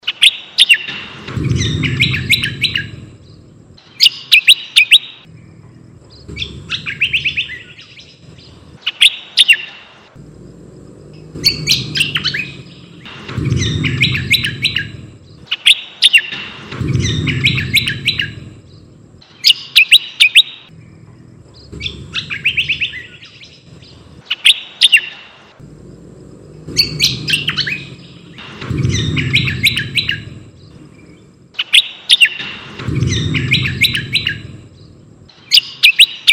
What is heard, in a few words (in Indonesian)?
audio burung cucak kombo